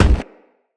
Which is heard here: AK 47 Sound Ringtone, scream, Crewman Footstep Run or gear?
Crewman Footstep Run